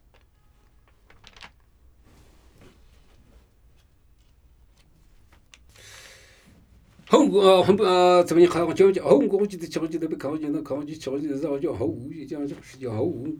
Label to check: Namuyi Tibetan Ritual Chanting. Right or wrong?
Right